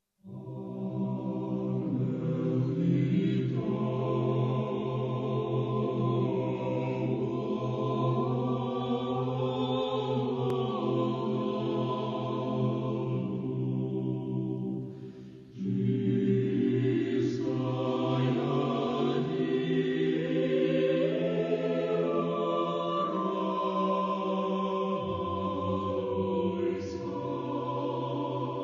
Ukrainian baroque; Verbytskyi

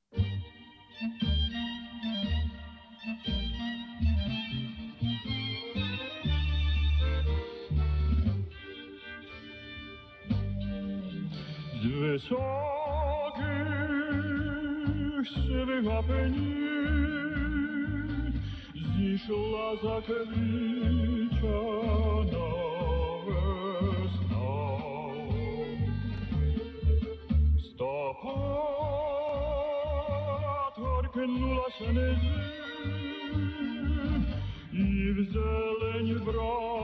Ukrainian tango

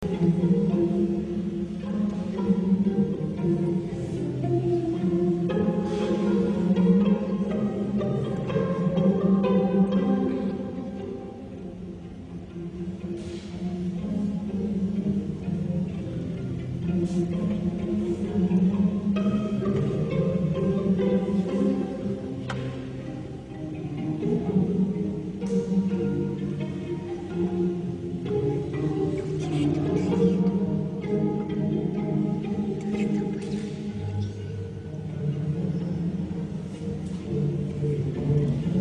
Baroque dance Ukraine

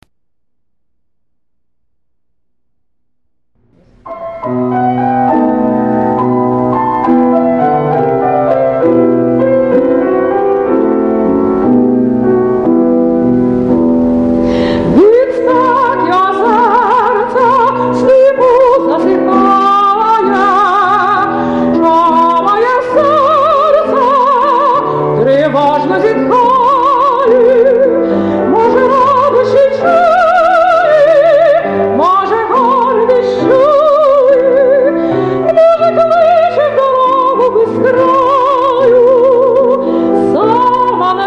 Ukrainian art song

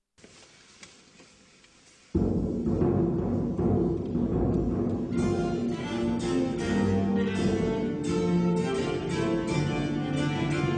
Baroque Ukrainian dance

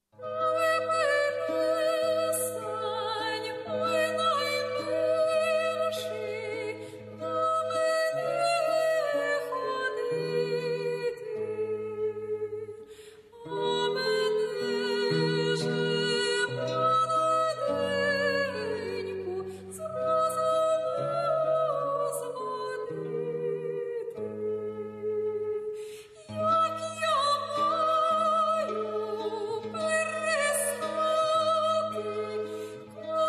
Ukrainian baroque love song

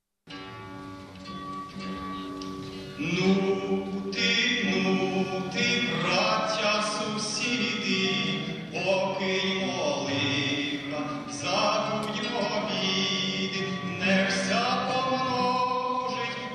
Ukrainian song 17 century

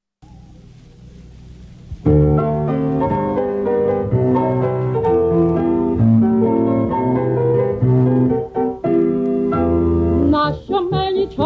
Ukrainian song; poetry Taras Shevchenko

